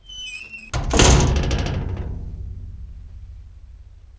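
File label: brass close; Mafie 1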